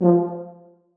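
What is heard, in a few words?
tuba staccato e2; Sonatina Symphonic Orchestra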